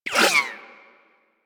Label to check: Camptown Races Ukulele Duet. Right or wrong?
Wrong